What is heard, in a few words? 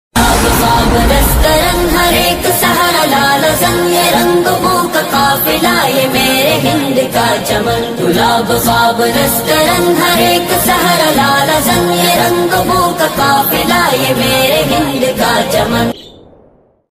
desh bhakti ringtone; 15 august special